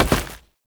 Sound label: Step Dirt, Foley; Warframe; Oraxia